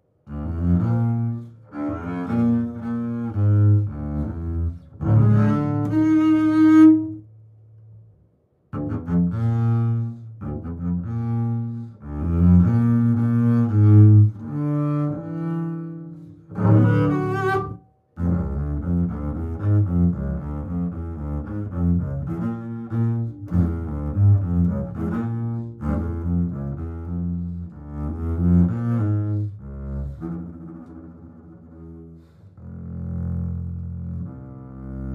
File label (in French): Contrebasse comtemporaine; louis-antoine lassonde